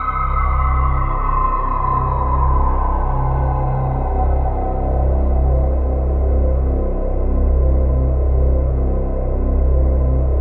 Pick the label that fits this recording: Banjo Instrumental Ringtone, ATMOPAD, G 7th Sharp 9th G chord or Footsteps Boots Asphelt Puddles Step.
ATMOPAD